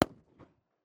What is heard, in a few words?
shot dist; weapons